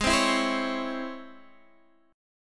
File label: C sharp Minor Major 7th, chord